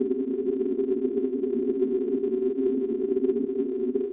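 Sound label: HUD invincibility loop; interface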